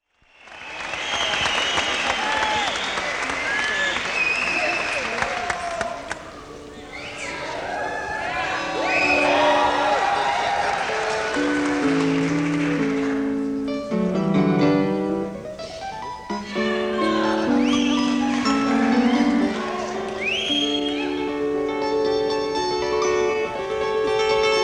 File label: Piano Concerto; Piano Concerto #20 K466 (Mozart)